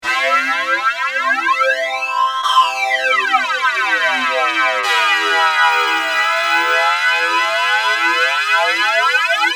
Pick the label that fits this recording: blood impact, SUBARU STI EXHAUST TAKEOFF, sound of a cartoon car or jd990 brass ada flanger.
jd990 brass ada flanger